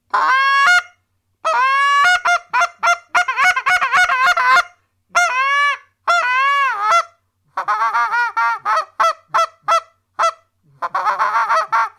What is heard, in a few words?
Canadian Long reed, duck sounds